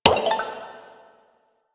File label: Messenger Window Open; Ambience; Warframe; Nineteen Ninety Nine